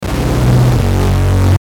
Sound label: Distortion Descend Buzz; Descending; Blastwave